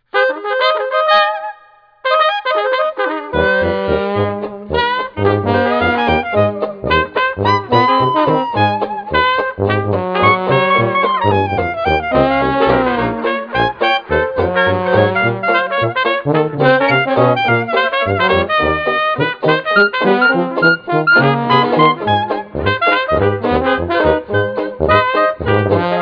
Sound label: bourbon street